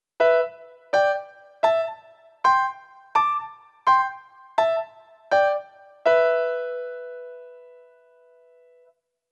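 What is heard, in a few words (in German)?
CHINESISCHE QUARTEN h-e trumpet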